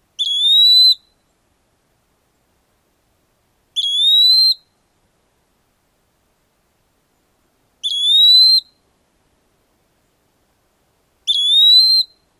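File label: Eastern Wood-Pewee purree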